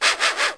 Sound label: sniff, whitetail, agressive